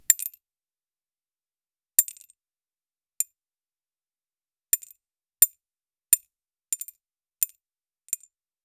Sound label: collision of diamonds; crystals